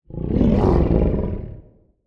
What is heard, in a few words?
Canine Fast Roar, Monster Sounds; The Decline Of Warriors; Monster Sounds Volume II